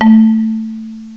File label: MARIMBA, MALLETS; M8; CMI Factory